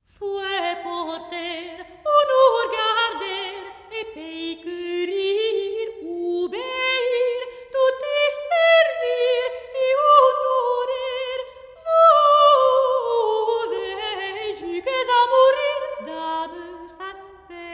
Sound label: monophonic virelai; Guillaume de Machaut; Foy porter